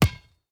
ball kick

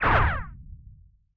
ricochet

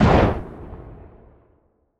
gunshot distant

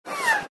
shell whizz

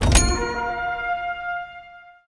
shop sale

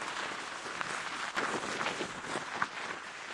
terrain ice

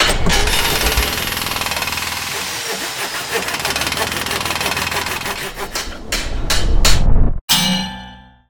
repair use

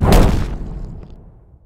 explosion bomb

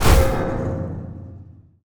impact player

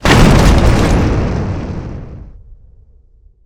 explosion tank

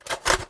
powerup reload

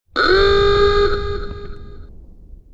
barrier destroyed

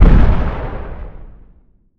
artillery distant

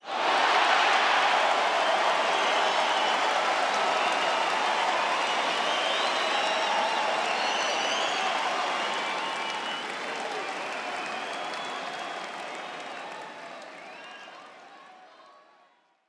cheer goal

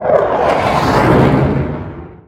airstrike flyby

